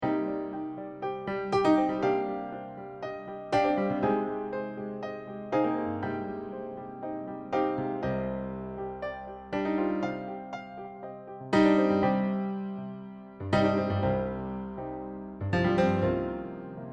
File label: piano song